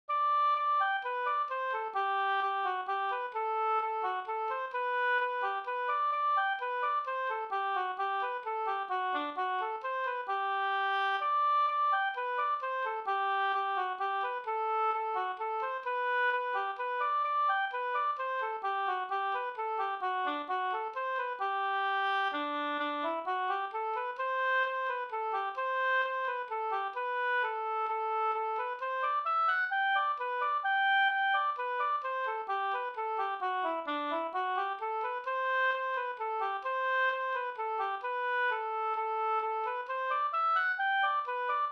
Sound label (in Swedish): vals G dur; Gas-Anders; Viksta-Lasse